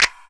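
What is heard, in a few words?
PER PROCU, Procussion, percussion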